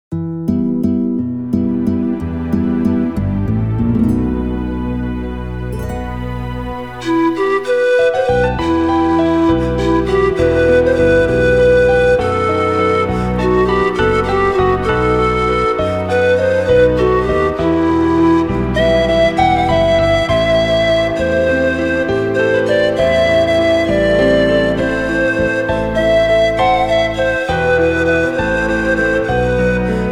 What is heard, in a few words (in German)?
Oh Wir Wollen Mit Euch Instrumental